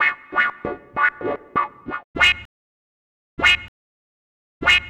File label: Beatnik Hop; Sonic Foundry (Sony Creative Software) - Stylus Pressure Urban Grooves on Digital Wax